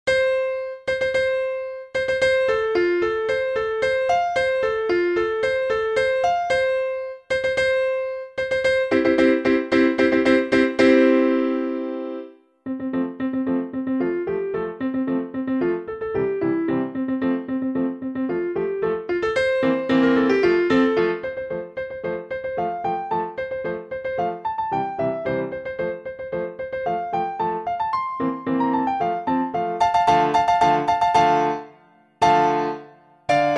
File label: William Tell Overture, percu